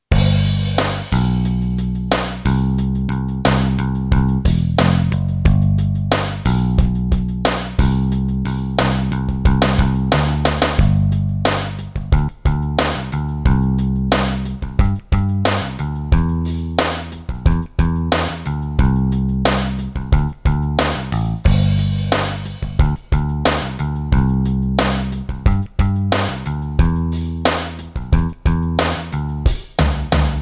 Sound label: 16TH FEEL, Boss DR-3